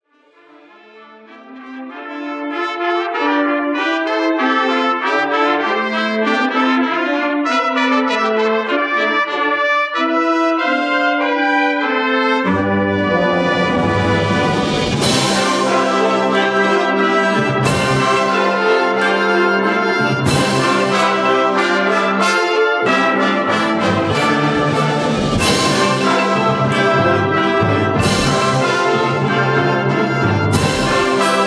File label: band, Live Music Recording